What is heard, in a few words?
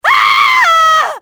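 scream, female; fmale; Soldier Of Fortune II Double Helix; XBOX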